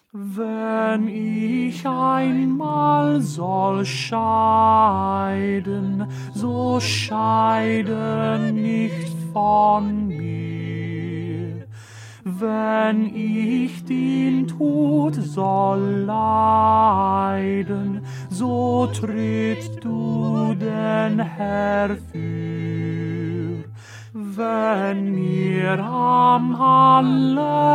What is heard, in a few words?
Tenor; Bach; St Matthew Passion